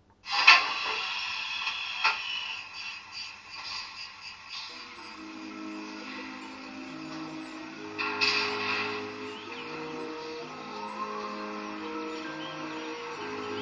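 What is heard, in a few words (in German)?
Soundmuster Bernina ABe 4/4 II mit Alpgeräuschen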